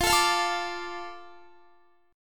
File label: F 6th F down Guitar; F6 strummed